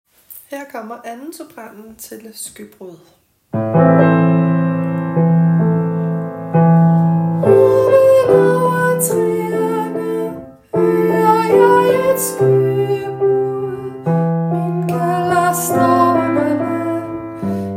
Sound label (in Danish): sopran